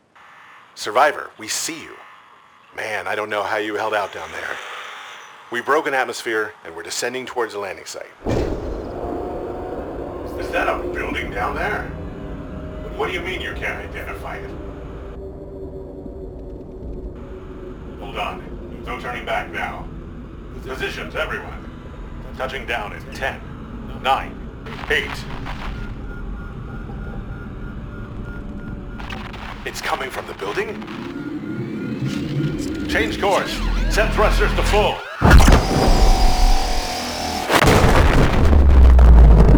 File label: New sunbeam event sound